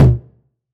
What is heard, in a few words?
909 Tom Sweet